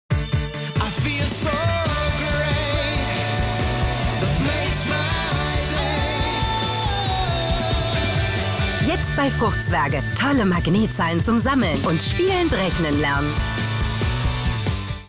tv werbevocals; fruchtzwerge

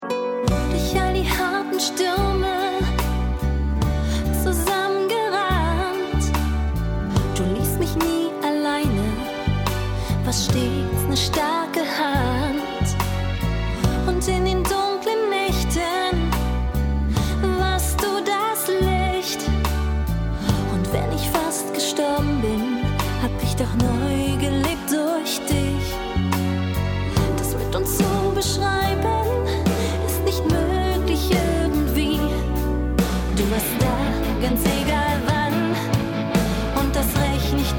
Mein Song

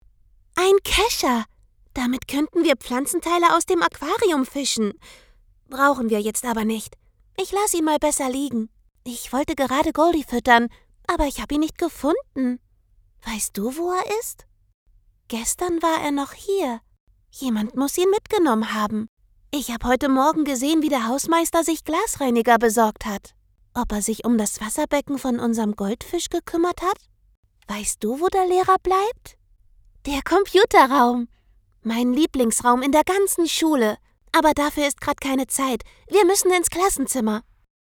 schreier kind; LOFT VOICES

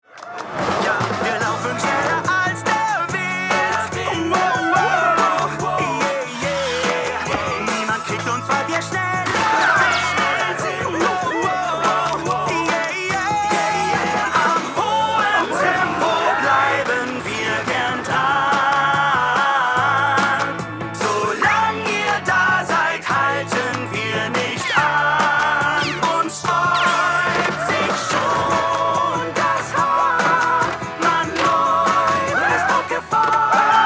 Gesang, Vocals (Gesang), Lip-Sync (Synchron), German; Gesang - Trollstopia; LOFT VOICES

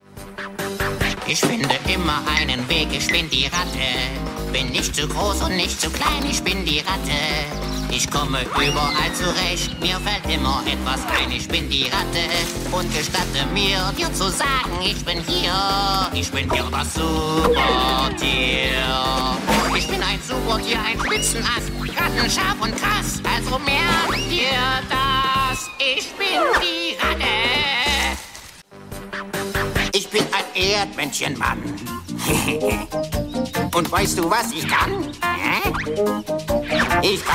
Gesang

